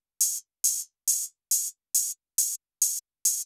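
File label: open hi-hat, Techno 138 BPM; KOELN; Best Service ProSamples vol.54; AKAI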